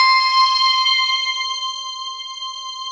World of Synthesizers, SYNBELL

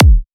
Hardcore Kick

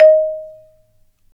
Marimba; Roland LCDP11; Africa VOL-1; Af.Marimbas

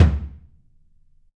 DW 16X18, Drums; Big Fish Audio; Ross Garfield Drums VOL-2; ST LOUIS KIT

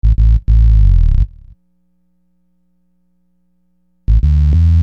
Big Buzz Bass, Hip-Hop; Construction Kit; Sonic Foundry (Sony Creative Software)

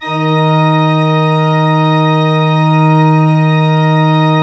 D2, Cathedral Organ; ILIO; Propeller Islands Cathedral Organ

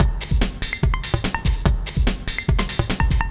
Beat, Drums & Percussion; Electrocution; Beats

